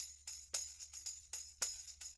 110 tam; Percussion

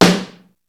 NEW KIT DPM3, Peavey DPM; Rubber Chicken Software Sample Bank

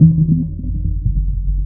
PERC RITUAL; Big Beat Samples & More; AKAI

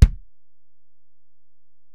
Ac BD, Alesis DM-PRO; 300 Drum Machines

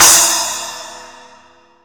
8 INCH SPLASH, CYM; Greytsounds VOL-1; SDX HATS+CYM

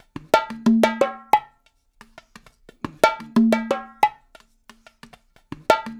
LOOP, Percussion

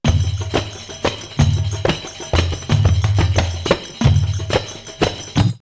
El Amein 90, drumloops World Ethnic; X-Static Goldmine

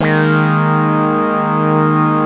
AQUA TARK, Synth

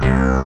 SYN WahWah; Best Service Gigapack 1

